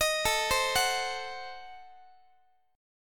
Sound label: A Diminished 7th A down Guitar; arpeggiated; Adim7; x,x,18,19,16,17